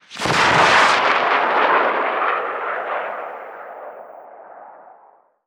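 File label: javelin ignition distant; Modern Warfare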